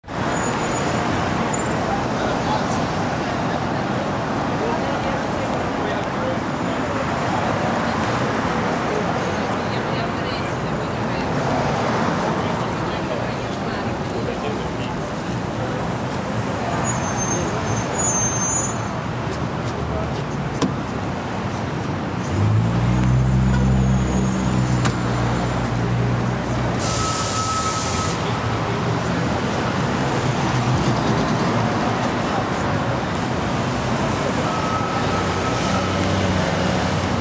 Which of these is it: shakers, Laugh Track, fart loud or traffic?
traffic